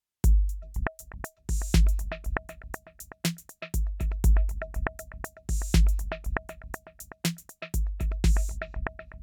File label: delay beat; aas objeq